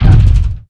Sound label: gear hard touchdown, aircraft; DCS